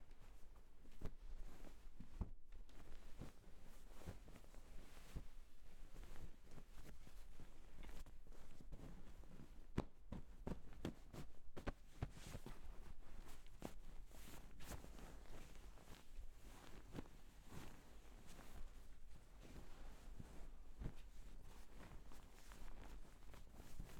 Making Up A Bed Feather Pillows Blankets And Duvet Sounds